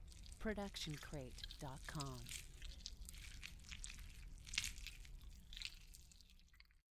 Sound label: stylized squish; soundscrate